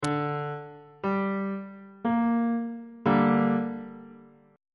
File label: a double flat minor 2nd inversion on bass clef